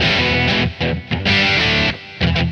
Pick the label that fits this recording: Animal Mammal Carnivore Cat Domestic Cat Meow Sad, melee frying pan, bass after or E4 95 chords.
E4 95 chords